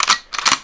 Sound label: ggun reload; pvp